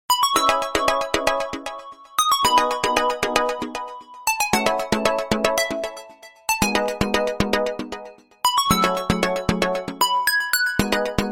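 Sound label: original ringtones and notifications Nokia 808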